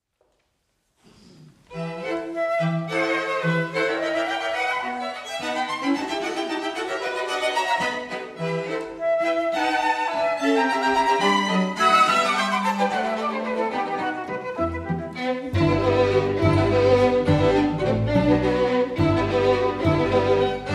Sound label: Scherzo for flute and strings; Arthur Foote